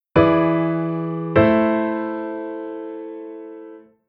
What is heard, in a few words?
Chord V7 of VI; Sec Dominant